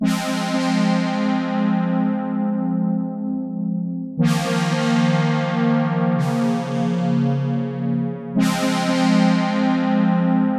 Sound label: ASR-1 Min slowpan